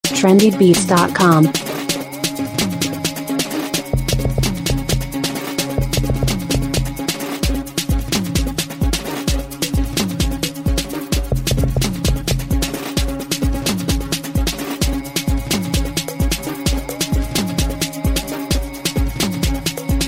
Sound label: Machala Dance